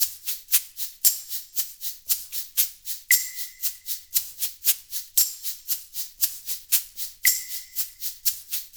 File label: percussion loop